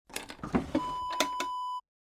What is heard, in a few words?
drawer dresser open; Dresser Drawer Open Wav Sound Effect